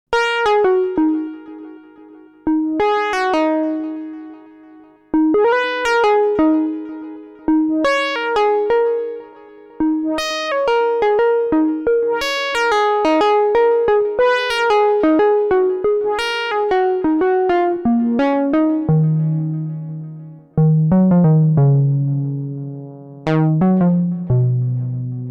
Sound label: pulse solo